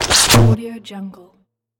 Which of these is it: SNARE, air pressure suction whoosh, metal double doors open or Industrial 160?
air pressure suction whoosh